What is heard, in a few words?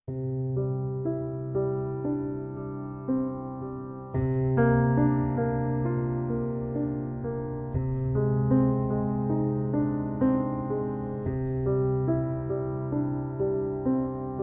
starlight melody